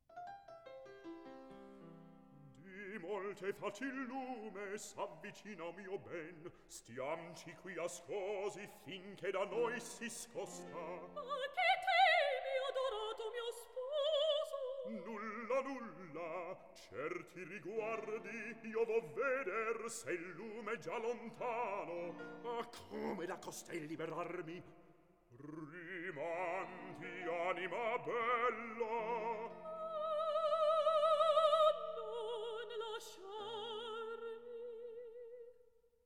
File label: Recitativo; Mozart Lirica